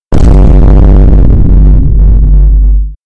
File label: vine boom bass boosted